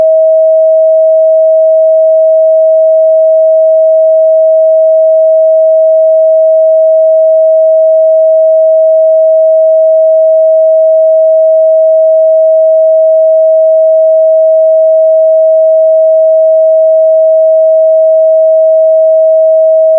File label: 639 Tone